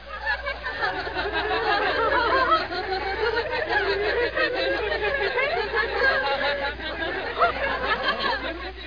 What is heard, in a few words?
Laughing Women; Museum of Anything Goes